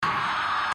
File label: gate slide; Code7